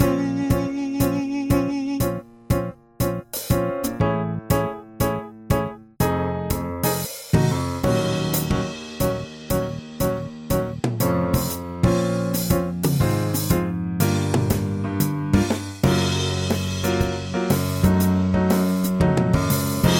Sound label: drum